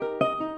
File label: lyre; code game jam drop plafond 2025